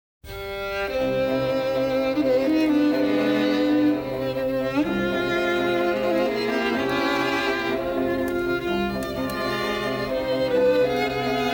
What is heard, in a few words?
strings; beethoven; emastered extreme